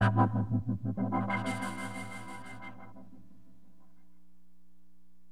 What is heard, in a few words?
LFO vox, keyboards; HipHop Keyboards; LiveAct Pool 1